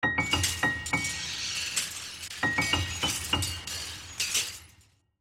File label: depressed in spirits